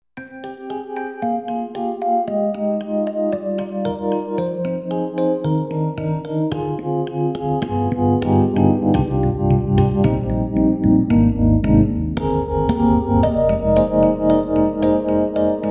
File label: EDIROL SD-90, Solo, Tremo Rhodes, Tremo Dyno